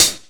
mellow hi hat sound D key, Loudest frequency: 5925Hz; hat samples